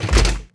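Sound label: droid bodyfall large metal; droids robots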